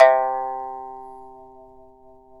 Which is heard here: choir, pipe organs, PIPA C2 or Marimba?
PIPA C2